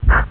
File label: Shhh; GoldPusher